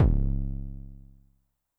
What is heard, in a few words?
BD MOD Overdrive Low, BASS DRUM, Roland TR-909 Modded; 300 Drum Machines; Modded Roland TR-909 Sample Pack